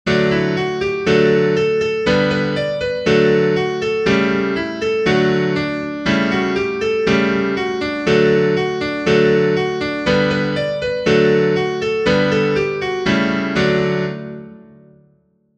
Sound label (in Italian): Ponticello doro, pianoforte